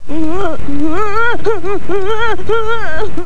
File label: whine; akira